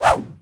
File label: foot swing; combat; CosmicRage